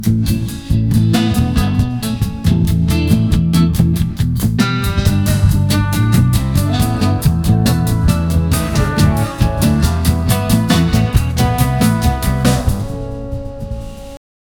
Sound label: drums and a little guitar, instrumental